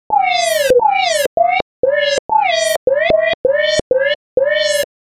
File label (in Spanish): sirena no espacial